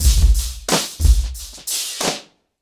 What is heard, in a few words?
90BPM, acoustic drums; The Roots Drumloops